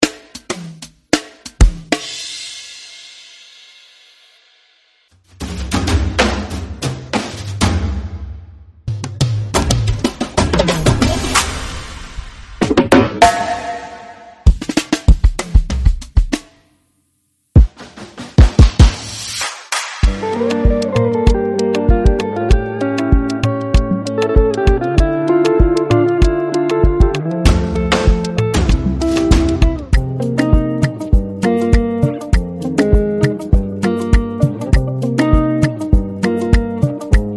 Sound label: drum fills; Switch Vol 1